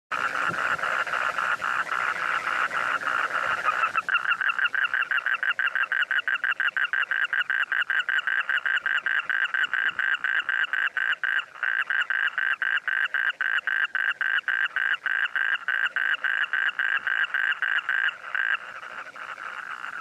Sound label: asian spined toad calling